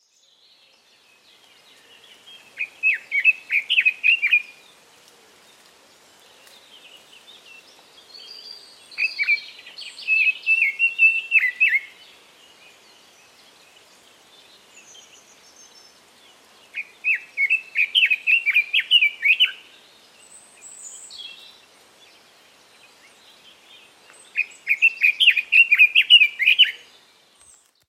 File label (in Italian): usignolo del giappone